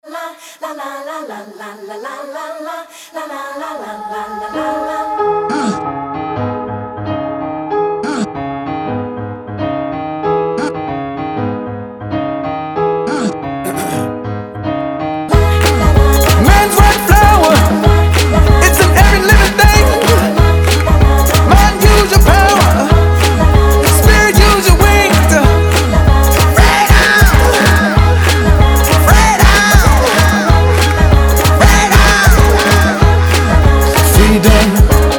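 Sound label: advert wind-up song; reklama megafon zavodnaya pesnya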